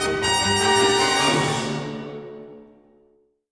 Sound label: battle lose, music